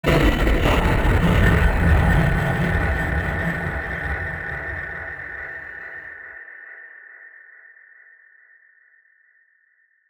Broken Machinery; SFX; Ambient - General

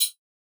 Hi-Hat Closed, drums, cymbals

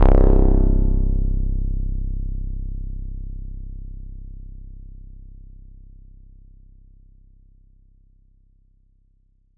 808, Drums, Bass

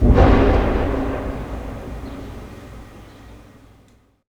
Distant Explosion; SFX; IRL Recorded

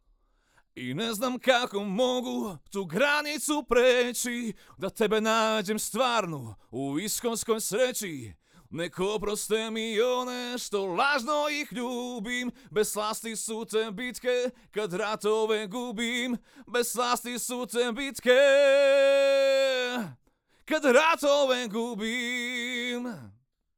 AKG Cardio; Reaper project

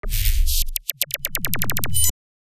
Artifact, Electronic

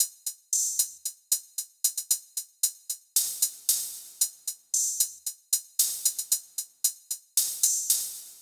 Cymbal Loop 114 BPM

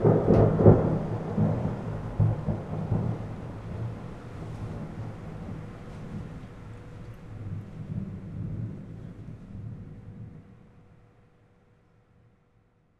Thunder

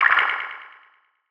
Wobbly, Ambient; SFX; Ambient - General

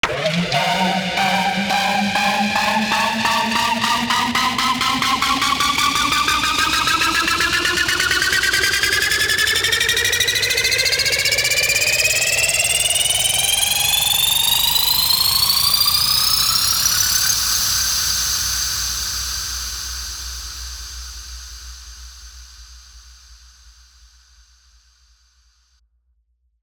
Synth Up, Risers Uplifters; SFX

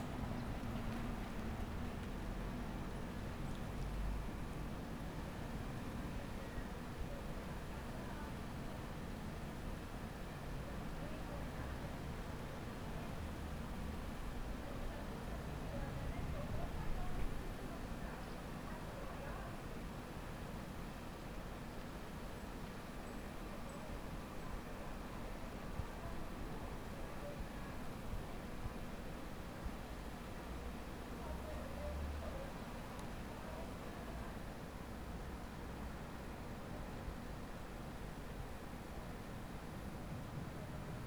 Block During Summer; SFX; IRL Recorded

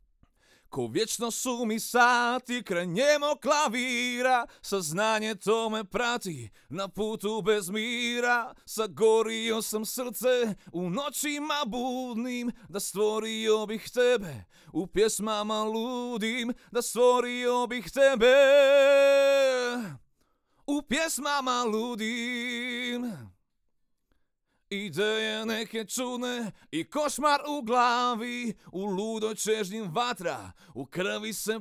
AKG Cardio; Reaper project

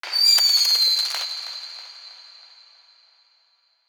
Firework Launch; SFX; IRL Recorded

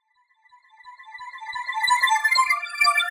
Bells, Electronic; SFX

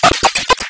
Audio Corruption, Electronic

